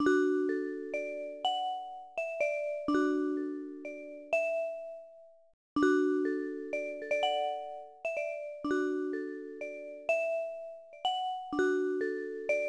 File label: BGM Melodie; Baby Life; nds